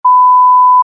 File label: Censor beep medium